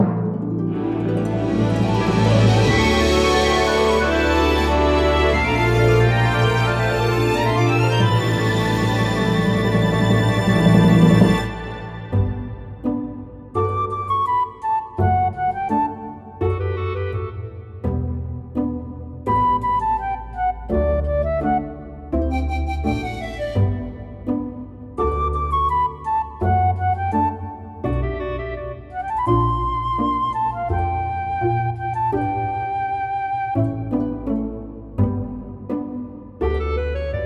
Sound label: bgm opening